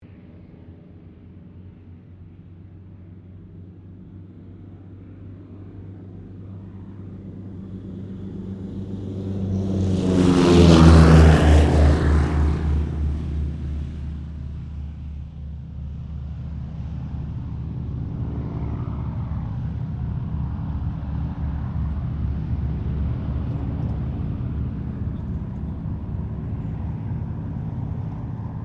AIRCRAFT LOCKHEED HUDSON TAKEOFF, Hudson twin engine light bomber; Airplanes; Vehicles